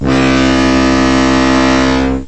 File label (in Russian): korabelnij gudok